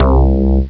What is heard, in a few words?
Bass; Prince of Persia